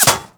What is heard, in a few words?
Bullet Impact Metal